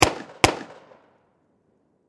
Warfare Pistol 45 Springfield Double Shot Distant Perspective; SFX Bible 6